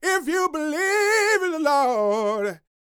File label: Vocal Gospel; Vocal Planet Vol1 Gospel